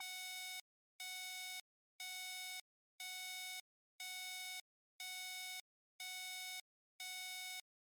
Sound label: Electronic Warning